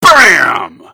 bam

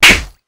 clothesline

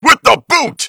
boot

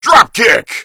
dropkick

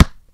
ball hit

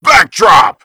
backdrop

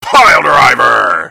piledriver

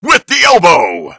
elbow

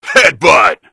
headbutt

